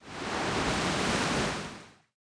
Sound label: Water; The Denpa Men; Android